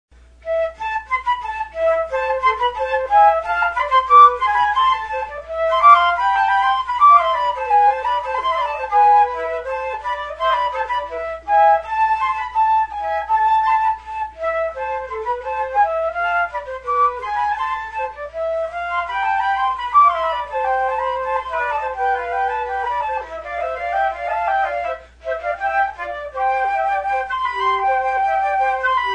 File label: flute sample